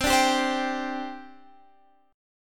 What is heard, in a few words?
G Suspended 4th C